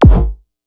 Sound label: kit, Yamaha Motif-ES8